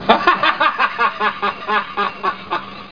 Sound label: Voice Laugh; Scratch